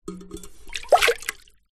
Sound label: household water in large metal pot movement; zapsplat